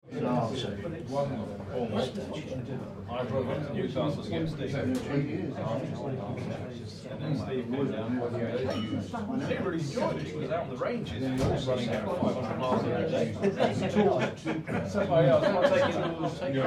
Pub Chatter Interior, Middle class few people talking; Bars And Club; Pub Chatter Interior 1 - Middle class few people talking; Bars and Clubs